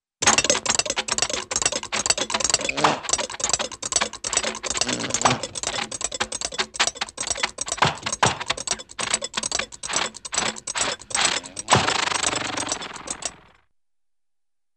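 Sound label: Funny Motor with engine Flutter; Cartoon; Hollywood Edge; Cartoon Trax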